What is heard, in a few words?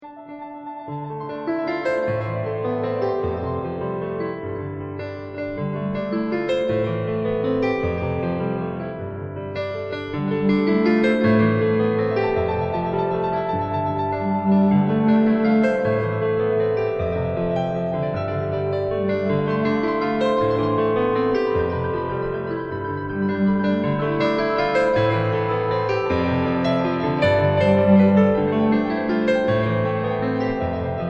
Waltz